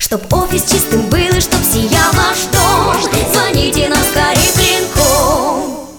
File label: jingle; KLINKOM